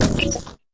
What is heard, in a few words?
fx drum, Electro FX